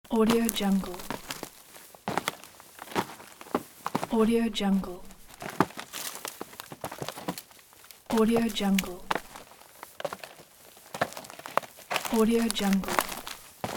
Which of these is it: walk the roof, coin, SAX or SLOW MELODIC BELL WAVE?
walk the roof